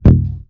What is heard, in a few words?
tap mouthpiece, tuba